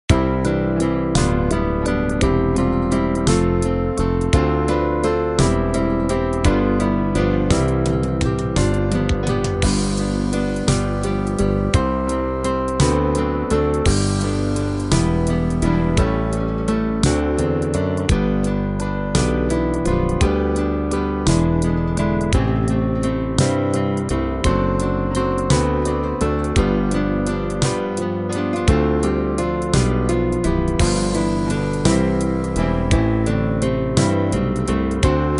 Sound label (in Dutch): karaoke